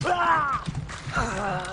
ahhh

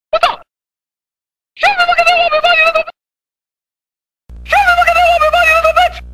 mortal kombat sound effects

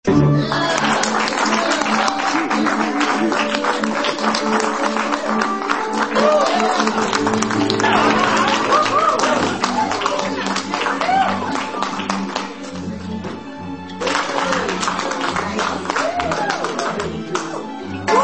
applause

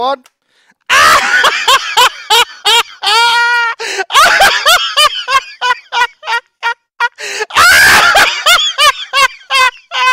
voicemail laugh; smite spring fling 2016

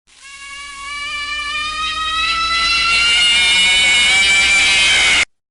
jumpscare reversed; fnaf 1

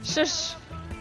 shush

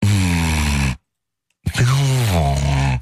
hummmmm

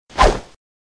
whoosh transition sound effect

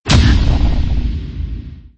kill success

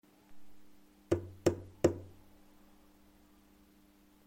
tap on door

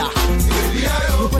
yippie eye oh

